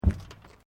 Footsteps Boots Plate Metal Step; Footstep Foley; Human

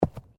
Bodymovement Fall Light

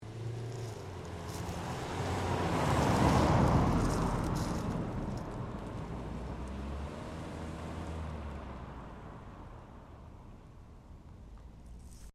Car Ford Focus Driveby; Ford Focus hire car; Cars (Sound Effects:Vehicles)

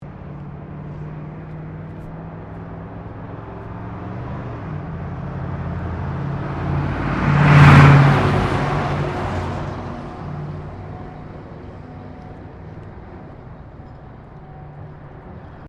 Traffic Car V8 Pass By; General traffic sounds; Traffic and Cars

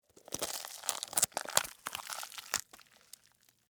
Crunch Green Pepper, Rip, Open, Vegetable; Texture

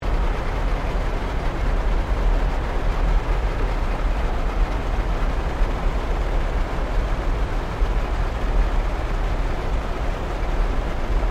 Created Ambience Specular Flames; Various Ambience created through sound manipulation in FMOD; Loop; Artificial Sound Effects

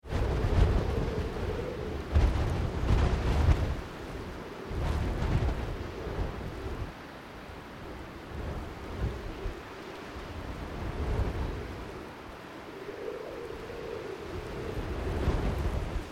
Wind in Grass Field, Blustery open wind; Weather

